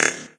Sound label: Negative Switch Sound; candy crush saga gamerip